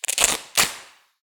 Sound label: event consume bandage